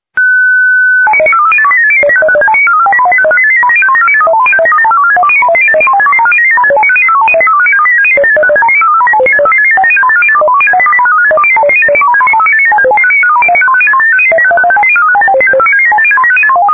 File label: ROS 16 2000 test message